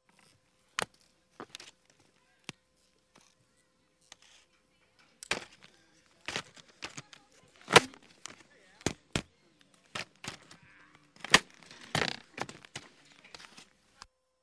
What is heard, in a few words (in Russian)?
zastezhka lyzhnogo botinka